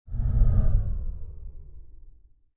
Zoom Chunk; Insanely Twisted Shadow Planet

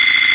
Score Count Loop; angry birds

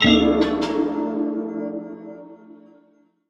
Jingle Gameover

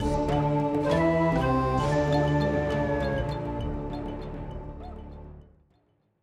Fanfare, Roland SC 55

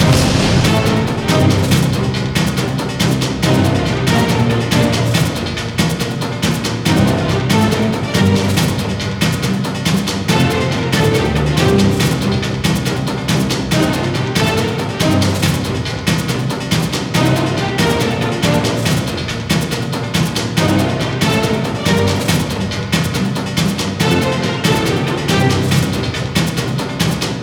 Starting Segment; Valley

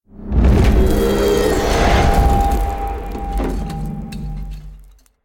Rumble Stinger; silent hill homecoming 2008 gamerip pc ps3 x360

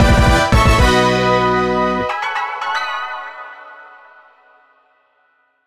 Destination Wheel Jingle; Chibi-Robo! Zip Lash

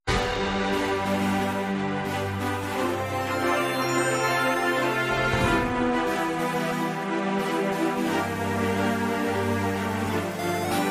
explore Loop; Blacksmith Story; android

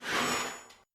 sound Metal Anchor; freedom fall

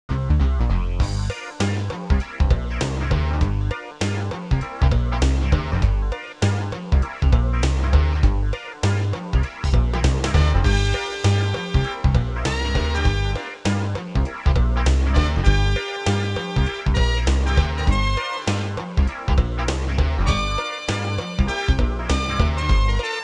Funky; Lunar Eternal Blue; mega cd

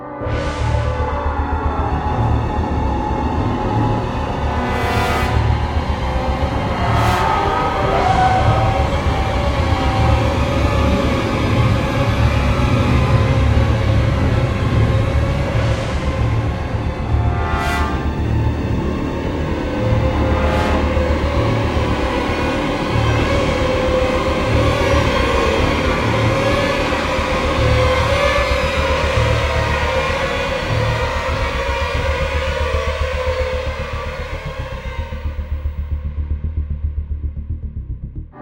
Close Loop; Fortnite Save the World

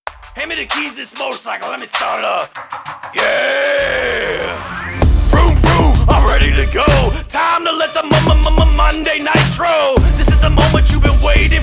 Loader Rap; Trials Evolution Gold

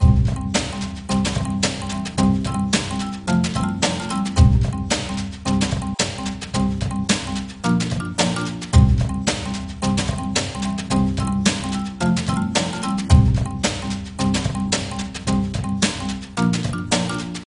Jungle Level

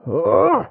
Grunt

